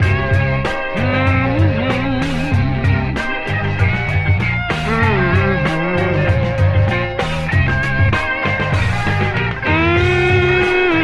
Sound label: full serpantine vocals, Loops, Phonky